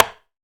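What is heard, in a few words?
conga mute; AKAI S-Series CD-ROM Sound Library VOL-5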